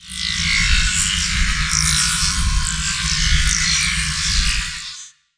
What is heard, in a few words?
emp tune